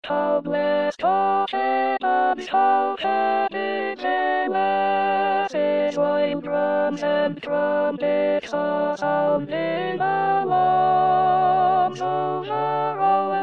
Alto

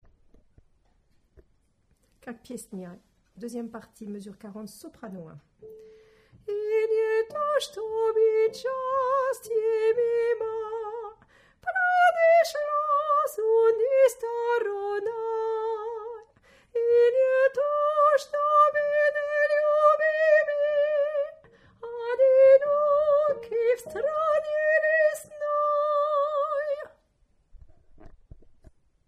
Soprano

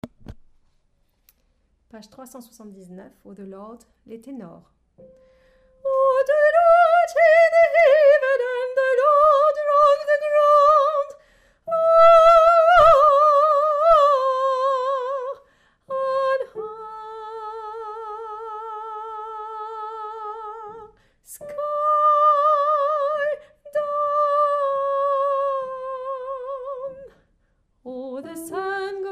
Tenor

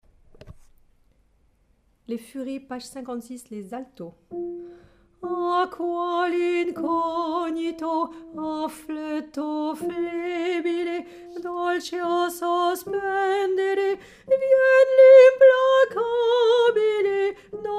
Alto

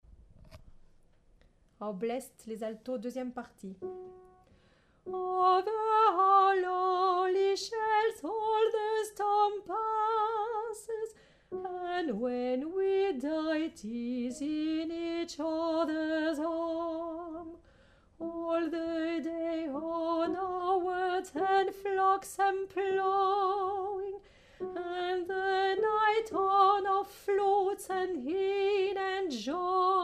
Alto

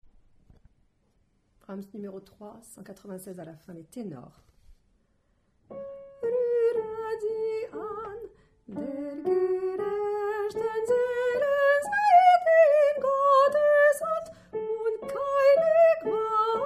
fin Tenor

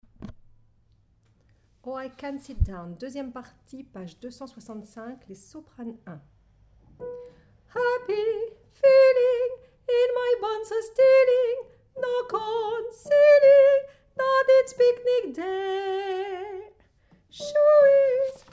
Soprano

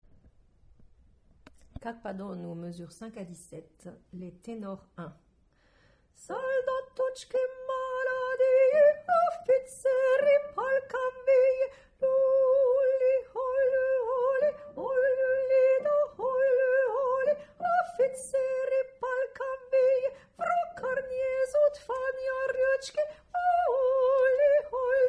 Tenor

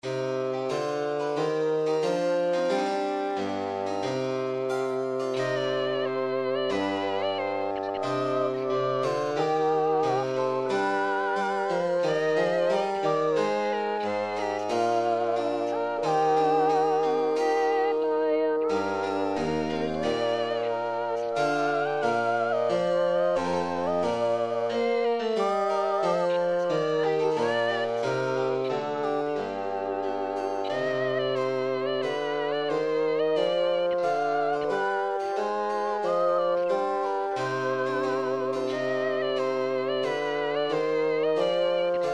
Soprano; Banish sorrow

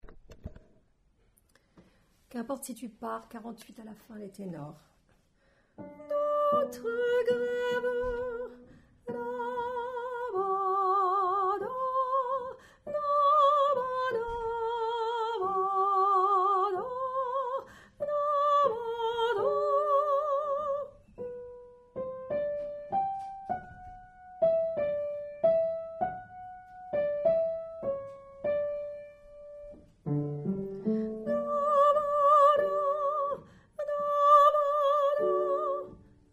Tenor; recreation

